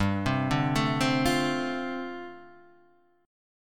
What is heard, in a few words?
D-Minor 7th G, chord